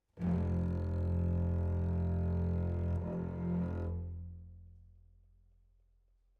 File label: Cbasses